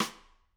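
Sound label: Snare Stick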